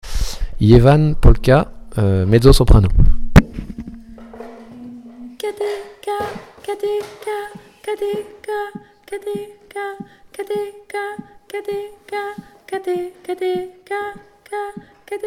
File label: Ievan polka mezzo soprano